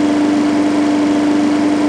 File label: Aircrafts Gear; DCS